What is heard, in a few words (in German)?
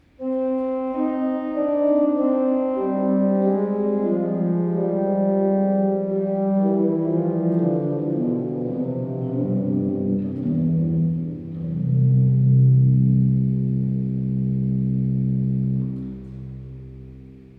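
prinzipal 8, Pedal, Prinzipal 8'; kirchenmusik; pfeifen; gereon koeln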